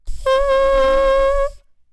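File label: Crow, Modern French Style Bassoon Reeds; Williams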